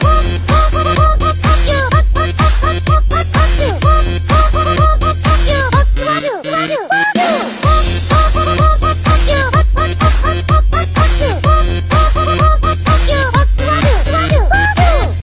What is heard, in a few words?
beat; sven